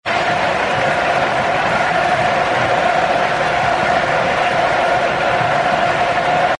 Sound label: soccer crowd fast chanting and cheering